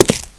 step, player; svencoop

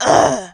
attack, Zombies; zps; Z lea

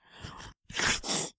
female air, Human Female Water; zps; Humans

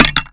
Hammer Collision; zps; Foley

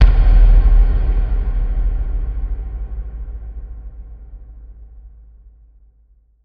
Round Ready; zps

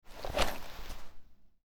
IED Draw; zps; Weapons; Explosives

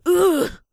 pain, Zombies; zps; Vanessa

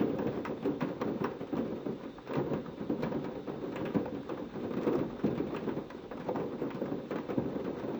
Rain Roof; zps; Ambient

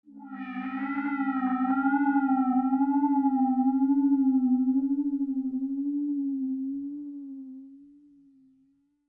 shredding synthhit; zps; Ambient

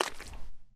mud, Footsteps; zps; Foley

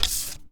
Key Card Pickup; zps

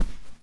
padded footsteps; zps; Foley

